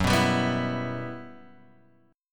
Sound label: E Minor F sharp, chord; Em/F# Chord {2 2 5 4 5 X} strummed